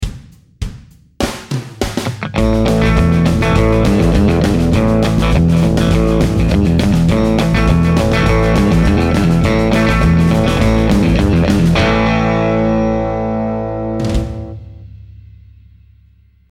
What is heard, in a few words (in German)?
Country Licks Lick